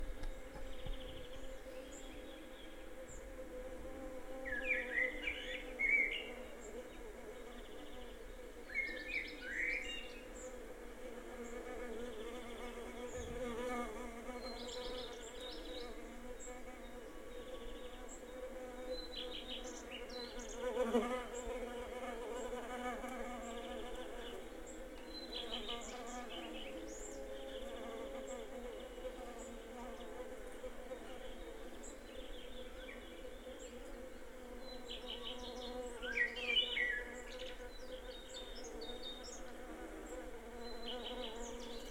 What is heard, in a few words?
bees birds garden